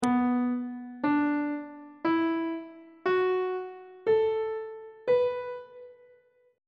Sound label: c flat minor pentatonic scale on treble clef